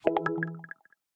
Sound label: NFC Transfer Complete; Nokia